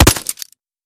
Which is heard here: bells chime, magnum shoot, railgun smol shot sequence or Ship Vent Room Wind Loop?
magnum shoot